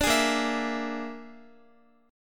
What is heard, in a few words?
Bb 7th Flat 5th Bb Guitar, chord; strummed; x,x,12,13,10,11; 4E,4A,5D,5G,5C,6F; Bb7b5